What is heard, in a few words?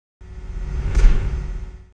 Hits